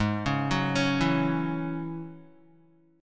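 A flat 7th Suspended 4th Sharp 5th A flat Guitar, chord; Ab7sus4#5; 4,4,4,6,4,x; arpeggiated; 4E,4A,5D,5G,5C,6F; chordshape; JGuitar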